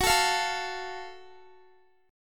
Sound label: Gb Minor Major 7th Gb down Guitar; strummed